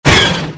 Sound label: carbine